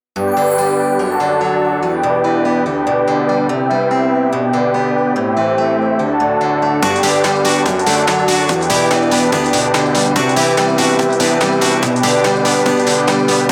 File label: Short instrumental version; koibito doushide surukoto zenbu original soundtrack